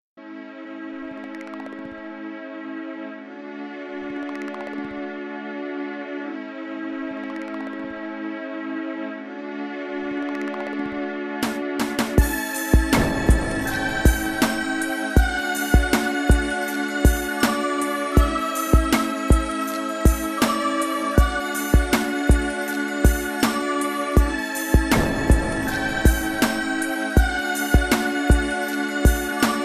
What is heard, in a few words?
spa music 432 Hz; przyjemnosc